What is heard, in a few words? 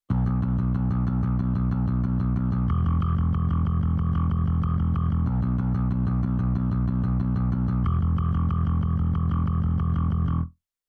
low off